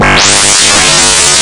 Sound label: laser gun; operation